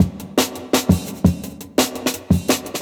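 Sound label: Jungle Breaks, 170 bpm; Poor; Original Jungle Breaks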